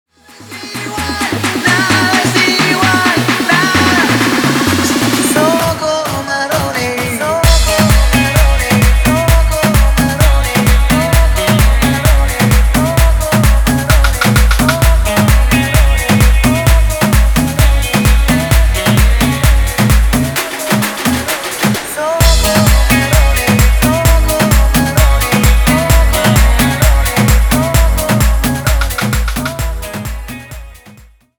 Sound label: guaracha remix 130 bpm